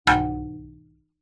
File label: impacts glass large window hit with wood stick